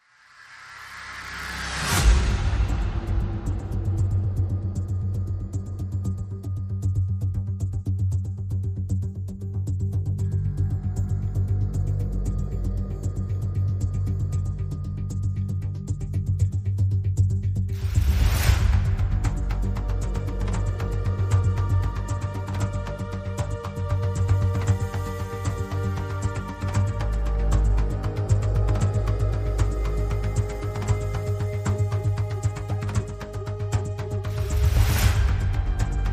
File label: Pads and Pulses